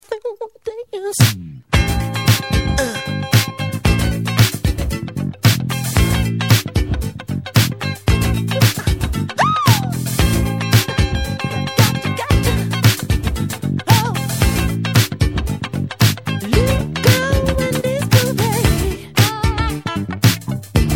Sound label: Live Bassist DJ